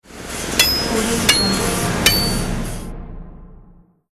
build upgrade; jbobstudio

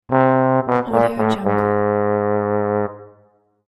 sad trombone

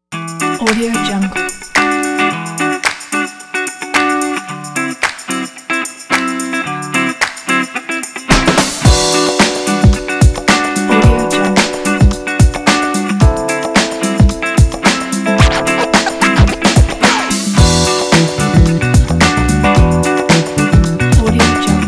funk

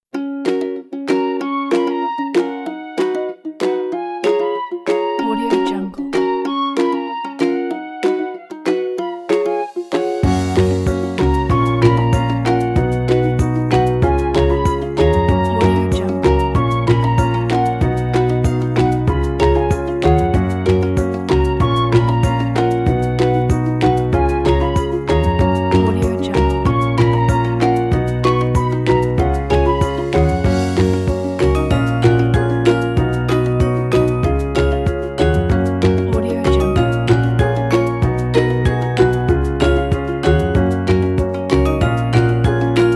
funny game; pinrecords

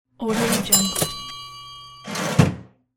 cash register

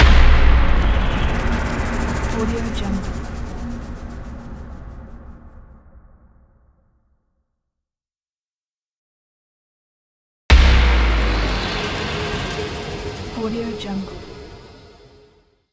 trailer impulse; anderington

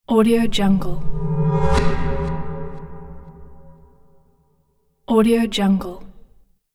morphing bell

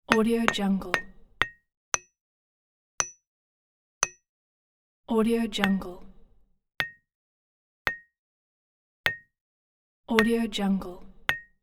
hammer on metal

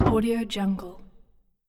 old wooden plank drop; stormwaveaudio

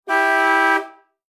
vintage car horn long blast; sound-ideas

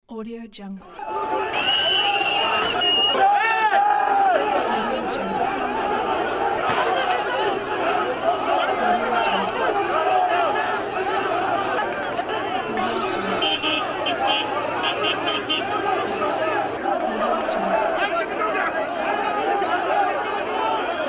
angry crowd

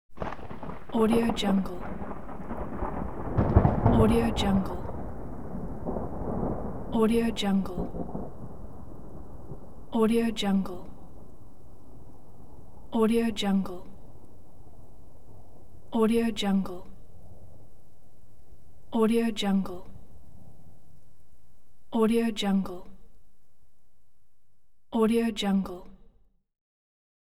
thunder gentle crackling; hollywoodedge

